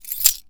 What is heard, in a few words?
Keys Pickup; zps